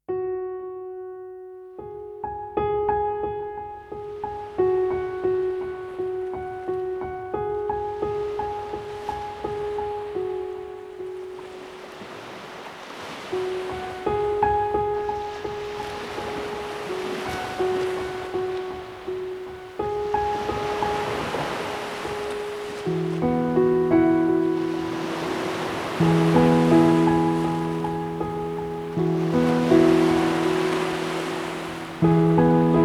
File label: Ocean Waves for Sleep, Water Sounds